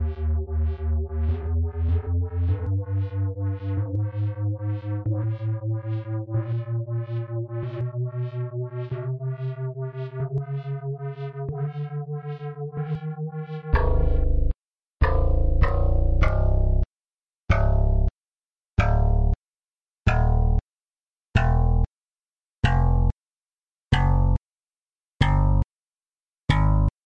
E Flat Chromatic, ringtone

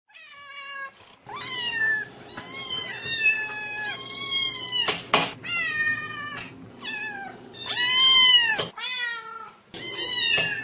kittens and cats meowing

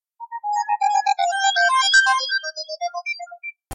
smart sms

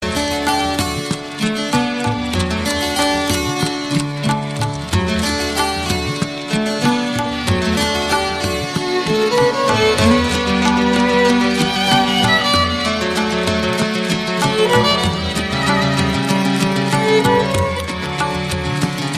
classical tone

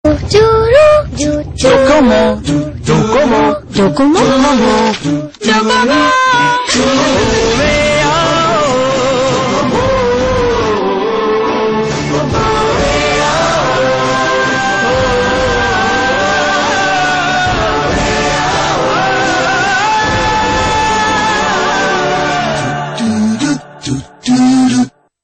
docomo ringtone

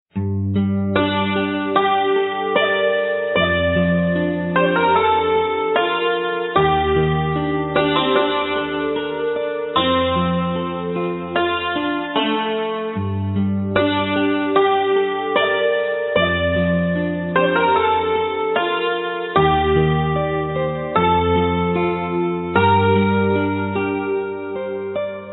naruto sad song

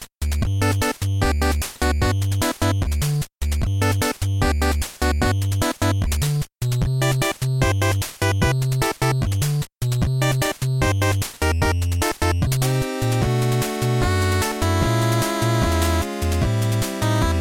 8Bit; Wii shop Channel

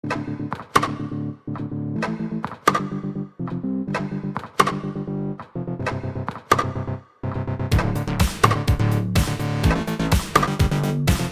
most cool sms

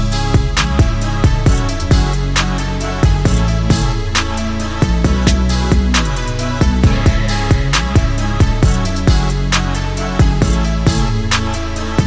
Sick Dubstep Beat

